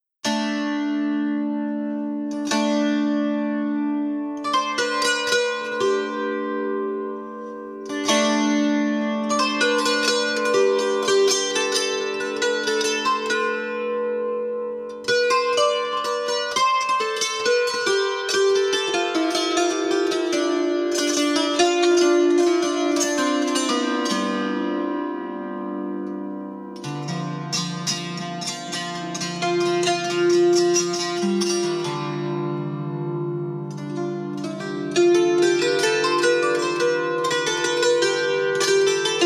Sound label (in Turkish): kanun taksimi